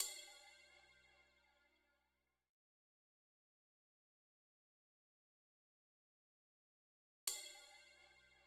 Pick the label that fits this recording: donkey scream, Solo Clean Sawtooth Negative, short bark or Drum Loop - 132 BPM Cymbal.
Drum Loop - 132 BPM Cymbal